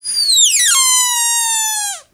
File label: Odd Whistle squeek, cartoon Foley Fx; musicradar; cartoon caper